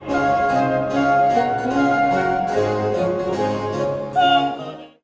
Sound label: cadences